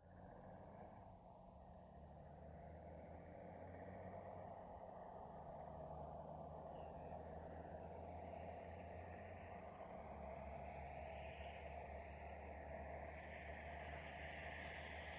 VEHICLES ASTON MARTIN: EXT: Pass, slow, long approach.